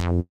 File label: F3 bass